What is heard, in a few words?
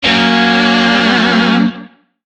Shawzin Hirajoshi Chord; Warframe; Lizzie Shawzin